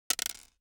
DICE on Hard Wood, Throw and Roll, Mini, 2 Two Dice; Samples; ArticulatedSounds; DICE Sfx Party (Extended)